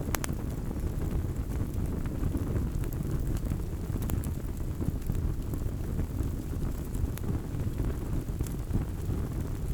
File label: Fire Intense